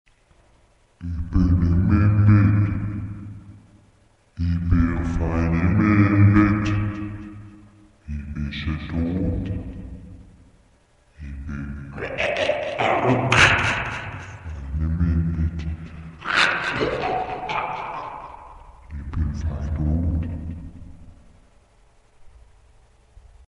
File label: Ghost